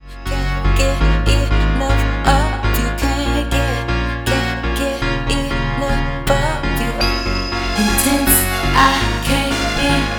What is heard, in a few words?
VOCAL MIX